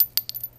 Falling Brass

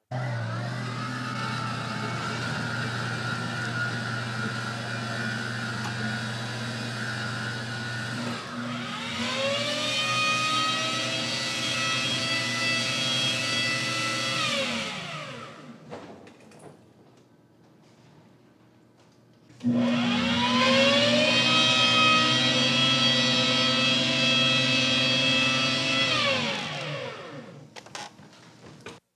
Spaceship Servo Motors

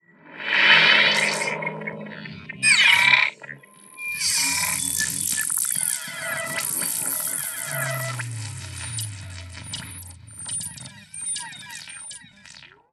Robots And Electromechanics

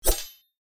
Sword Sound

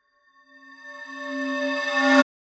Metallic Horror Riser

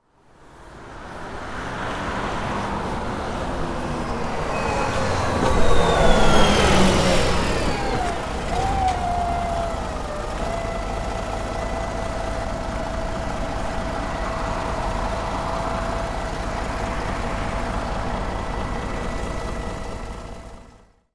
Old Bus Arrival

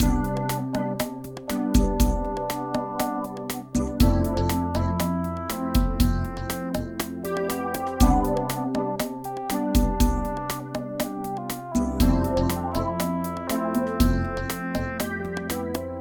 Loop Pop

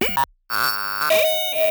Glitch

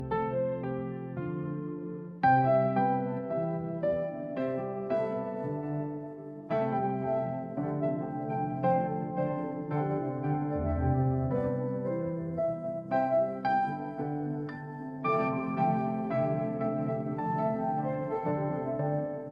Soft Piano Loop

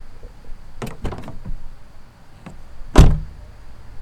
Car Door

